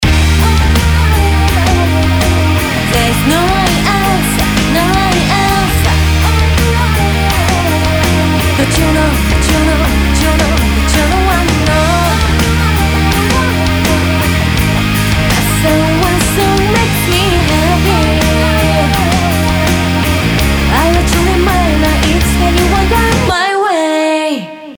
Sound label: louder